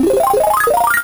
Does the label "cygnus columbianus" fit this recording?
No